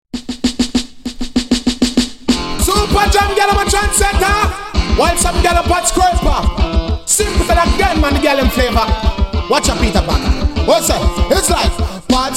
Pot Scraping riddim

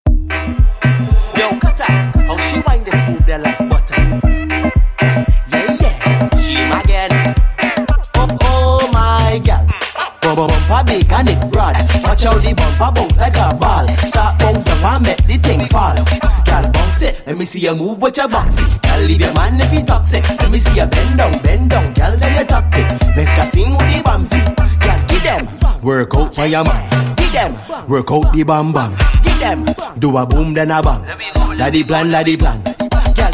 Riddim

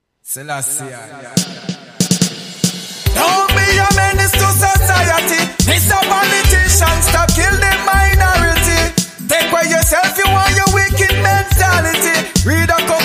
longtime african riddim

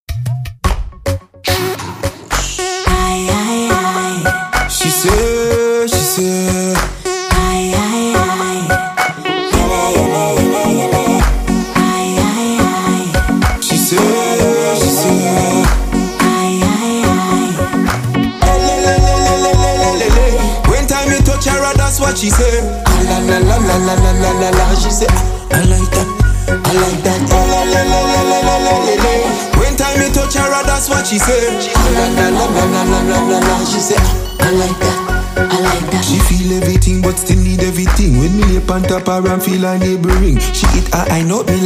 riddim

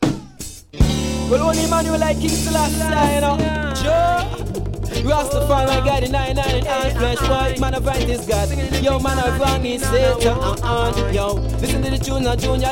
juggling riddim

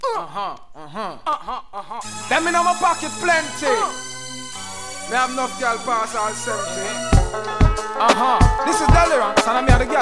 riddim

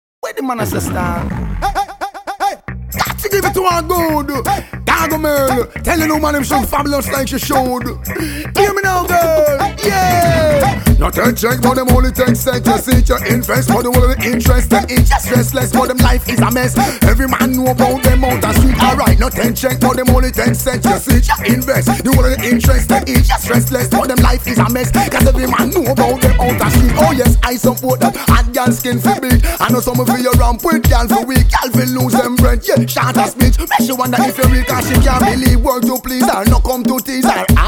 mad guitar riddim

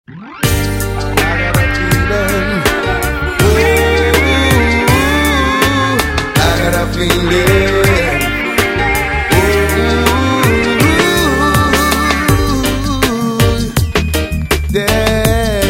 storm alarm riddim

